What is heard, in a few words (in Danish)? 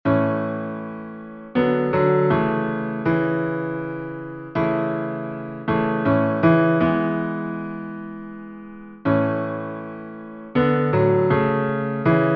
Amelievals; Lumbye; melodier